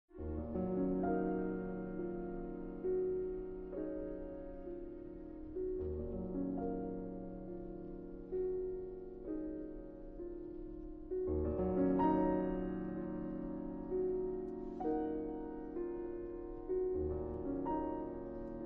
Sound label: Concerto for Piano and Wind; 2nd Movement